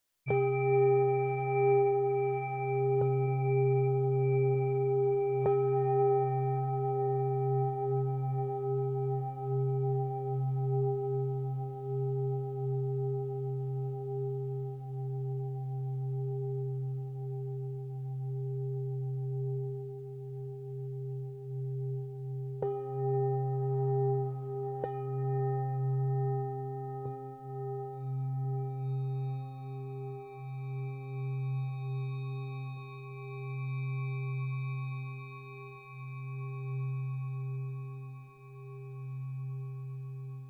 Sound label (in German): Tibetische Klangschale (Stille Meditation)